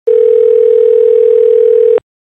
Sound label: telephone ringing